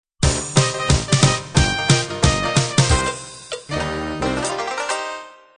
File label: dixie end